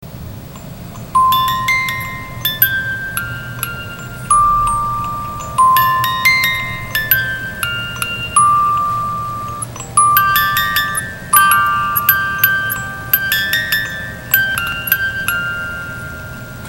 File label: 18 Note